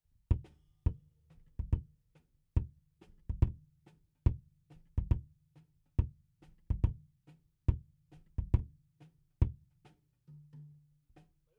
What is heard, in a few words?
Kick In